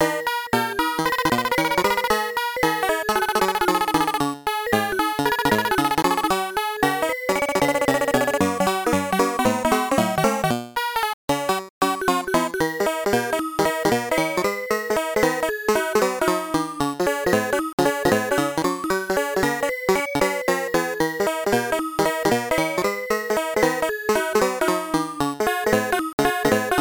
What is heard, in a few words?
In Game Music; grand dad